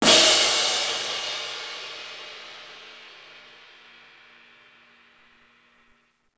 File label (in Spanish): platillos